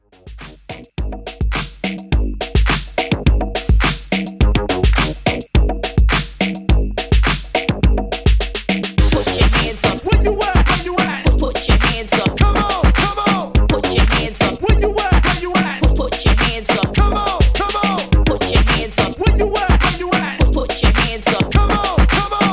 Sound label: tiktok dance 105 bpm